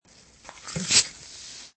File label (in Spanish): apertura agua gas